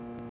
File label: nyc interior, ambience; Soldier Of Fortune II Double Helix; XBOX